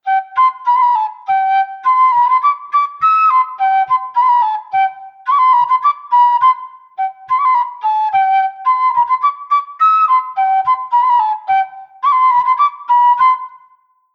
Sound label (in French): Chanson limousine bourree; Bouchor Tiersot; 1ere bourree